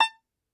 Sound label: Tenor Banjo, Plucked Strings